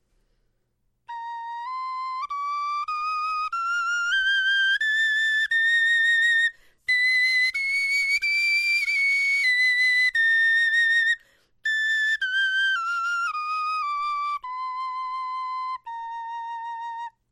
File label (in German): tonleiter